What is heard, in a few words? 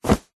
clothing jeans denim pants trousers drop carpet; zapsplat